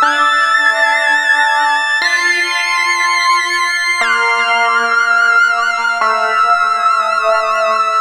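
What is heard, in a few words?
extra lead (synth); Sonic Foundry (Sony Creative Software); Ugly Remnants VOL-2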